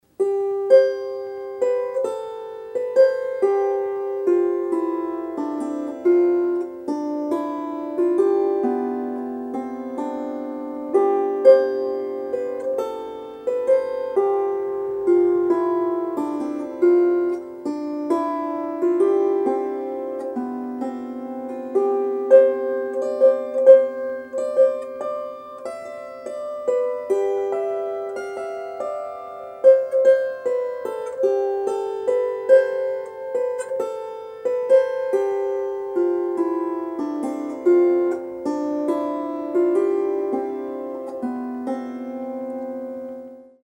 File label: harp, wire; limerick